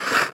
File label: blader, iceskate